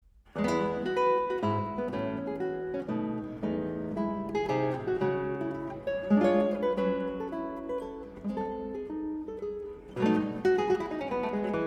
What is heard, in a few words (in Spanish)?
guitarra